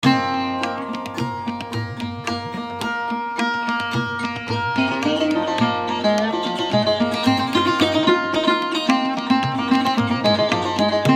JHAPTAL Megh

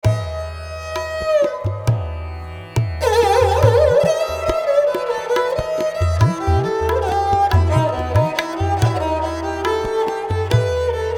JHAPTAL Patdeep

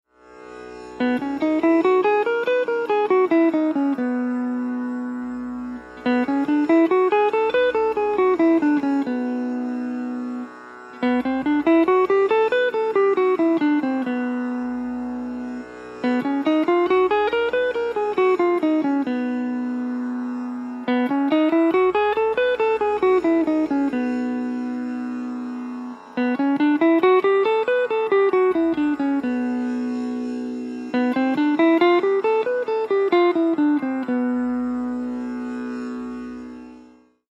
Major Modes; murchanas

